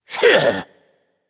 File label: grunt attack; smite; voicepack; jingwei